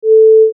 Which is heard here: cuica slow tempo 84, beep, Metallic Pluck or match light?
beep